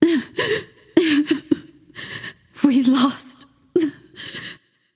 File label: boohoo; smite; voicepack; medusa